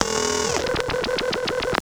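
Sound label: FX right, attacks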